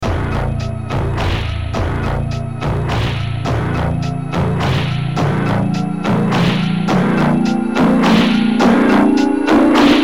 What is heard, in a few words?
cluster ADSR LFO